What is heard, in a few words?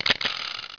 roll